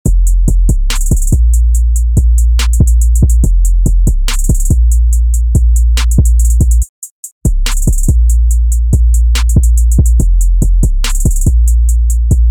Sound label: 808 kick boom bass snare hit hats roll 140 bpm trap loop